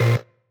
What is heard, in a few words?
marimba; ゆめ2っき